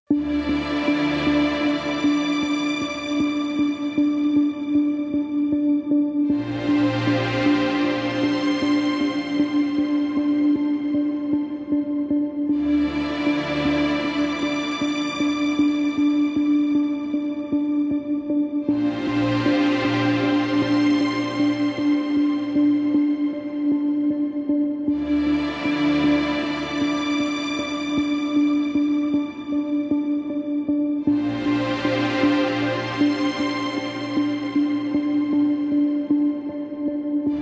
Documentary Soundscapes Light Serious without violin solo